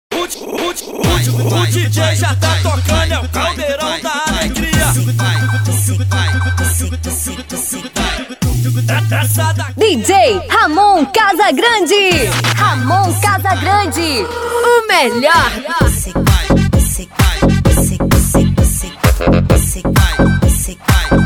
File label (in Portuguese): mega funk